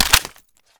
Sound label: ump45 mag out